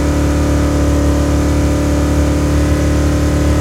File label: Truck Explorer Loop; Trucks